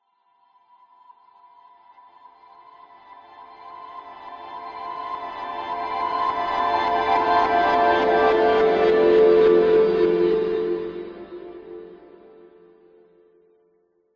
musical heavenly dreamy plucked swell revrsed back to reality; zapsplat